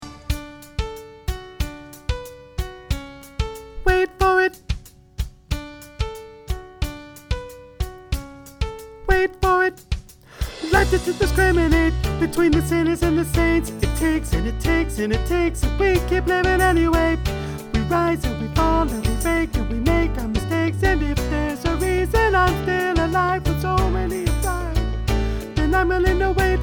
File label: alto